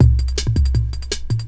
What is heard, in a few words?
break